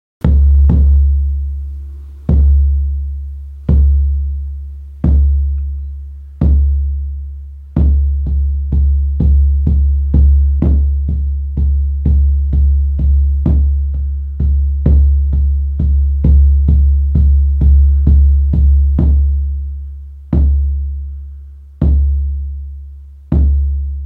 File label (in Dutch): paard, sjamanendrums